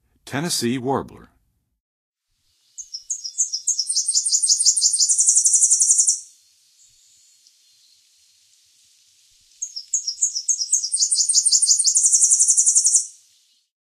Tennessee Warbler, Songs of Birds of North America; Western USA; Western USA Songbirds